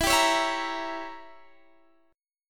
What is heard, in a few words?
A sharp Diminished 7th E